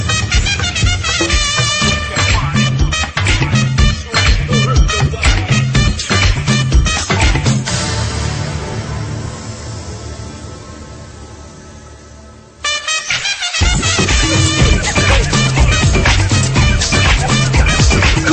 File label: Trumpet Mix; Colgate